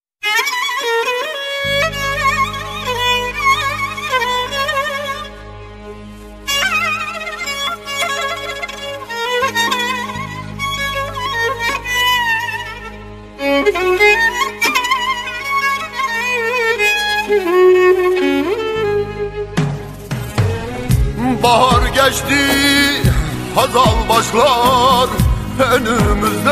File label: Arabesk